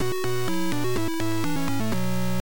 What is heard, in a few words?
Intro, Beeper